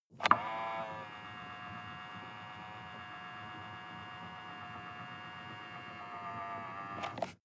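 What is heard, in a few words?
sports car interior side mirror adjust long; verkehr transport; autos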